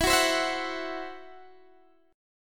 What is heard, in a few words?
E Suspended 2nd Sharp 5th E, down, Guitar, Open D